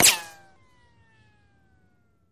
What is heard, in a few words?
Bullet Ricochet Sin